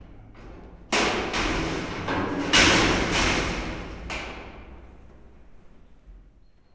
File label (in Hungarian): rácsozat elhúzása; miskolci zoo 2009